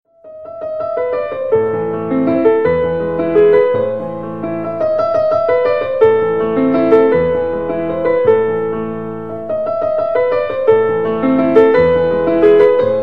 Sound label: fur elise piano